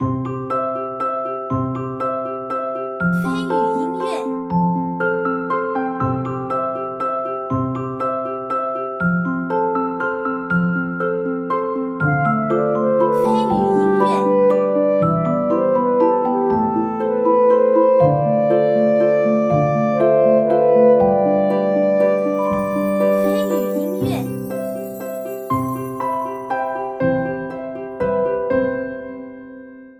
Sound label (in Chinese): 宠物抓捕; 宠物王冠